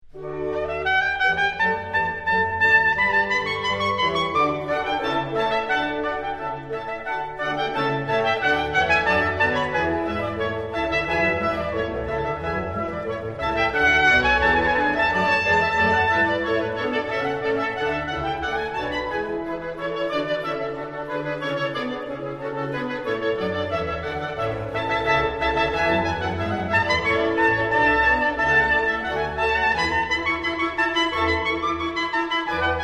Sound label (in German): Trompeten-Konzert D-Dur Allegro, blasorchester